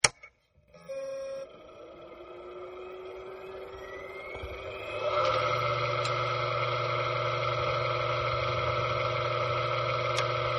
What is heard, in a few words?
Harddrive Fail, External Hard Drive Failed After Being Turned On; Computers (Sound Effects:Electronics)